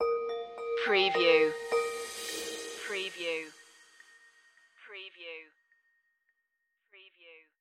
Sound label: safari lite stinger